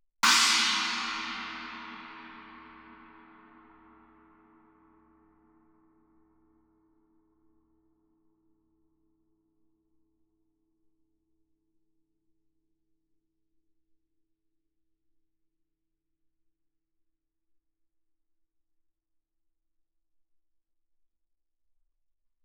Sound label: chinese stick shoulder ff, suspended cymbals; Percussion